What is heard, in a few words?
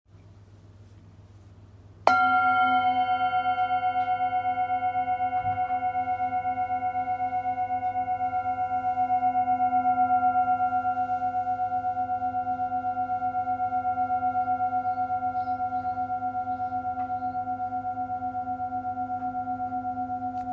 bowl, singing bowl; small singing bowl 16cm; Polished Harmony Bowls